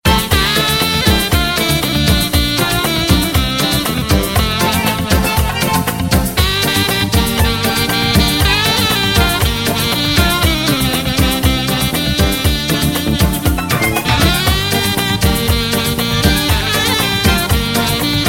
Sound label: Ringtone Mobile